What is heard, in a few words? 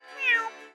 meow; Animal Sounds; Various Animals; Short calls